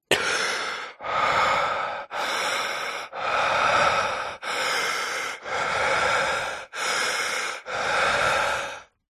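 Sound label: human male breathing in and out through mouth; zapsplat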